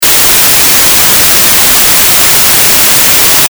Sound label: white